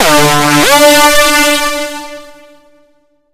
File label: used powerup; video games; source engine; tf; mvm